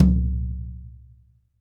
TD-20 Tom A, Roland TD-20 Toms

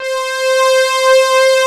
OBX SYNTHS; Pro-Rec - Synthsonic; JV ANALOG1

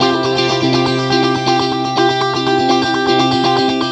guitar, stringed instruments

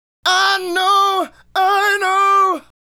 Male Solo, Hip-Hop and RnB Vocals

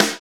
NICE SD; DR.ACCESSOR

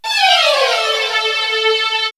String Run, string section; X-Static Goldmine; backtothe70's

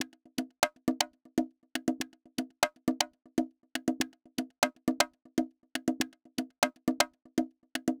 Conga Loop 120, Perc Loops, Loungin House; Equipped Music

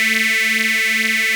OB A4, VOX, Synthesizer; Hollywood Edge - Giorgio Moroder Rare Synthesizer Collection

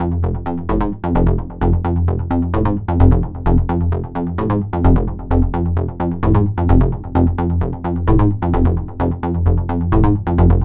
NRG Bass, Trance; Basses; Sonic Foundry (Sony Creative Software); Trance NRG VOL-1

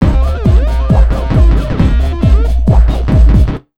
Big Beat Drumloops, 135

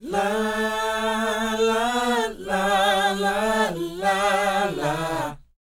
NA-NA D4, R&B Dance; Spectrasonics Vocal Planet

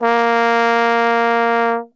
A#2 BASS TRB, Brass; Best Service; Brass Super Section

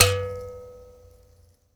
PLK Grand Mbira; Roland LCDP11 Africa VOL-1; M'biras

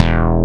MINIMOOG

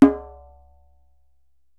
DJEMBE, African Perc

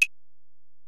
Ac Perc, Drum Machines Alesis DM-PRO

